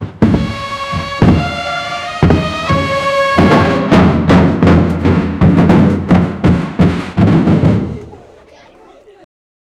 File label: a marching band that is